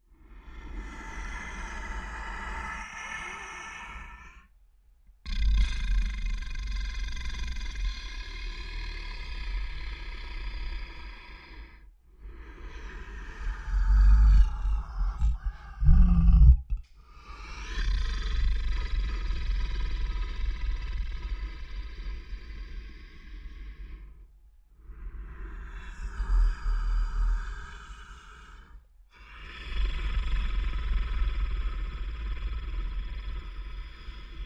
Creature Large Breaths Resting Calm; Scary Breathing; BigRoomSound

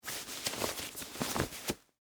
Dress Shirt Unroll, Sleeve; Foley, Clothes; Dressing; BlastWave

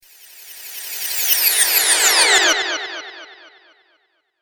Some Merlin Magic, magic sound effect; fantasy sound effect

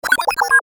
DIGITAL BLEEPS WAH; Beep; Multimedia; Blastwave

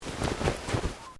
Clothing Shirt Cotton Move; SoundEffects Foundation2

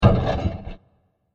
Smashed Elements; Burst Sound Effects; Bursts (Sound Effects:Impacts)

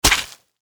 Impact Splat Juicy Wet; Splats

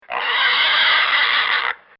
Pig Squeal; Pig Sound Effects; Animals

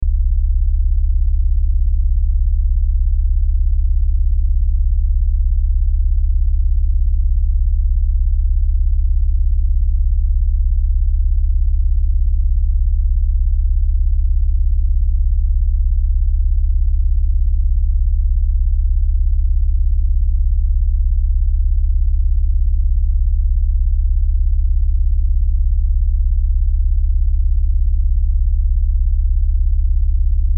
Lfe Pulse Low Bass; Drone

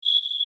Insect Voice Chirp, Vocalization, Bug; Animal

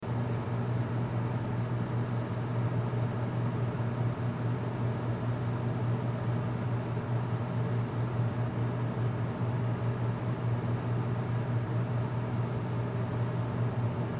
Drinking Fountain Hallway Room Tone; Drinking Fountain Loop Hallway Room Tone; Ambience; Construction; Blastwave

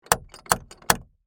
Foley, Door, Knocker, Three, Times; Door Knocking; Doors; BlastWave

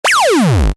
Retro SFX, Retro Style, Harsh, Heavy; Tech; Stuart Duffield

